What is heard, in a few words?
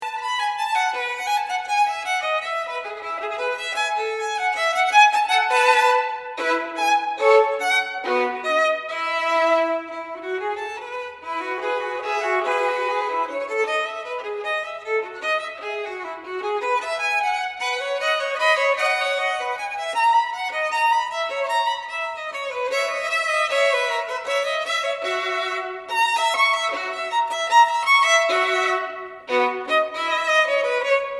Violin Partita in E Major; Violin Partita No. 3 in E Major BWV 1006